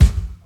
bass drum sound b key, Royality free kick tuned to the B note. Loudest frequency: 319Hz; kick drum samples